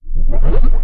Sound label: Ability Charged; Kilta